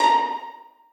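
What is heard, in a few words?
2nd violins pizzicato a#3; Sonatina Symphonic Orchestra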